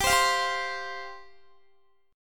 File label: G 7th Suspended 4th G down Guitar; Listen to G7sus4 strummed; G7sus4 Chord